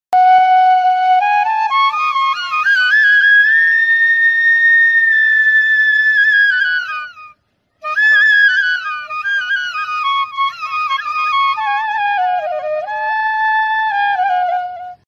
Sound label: Bansuri; فلوت بامبو هندی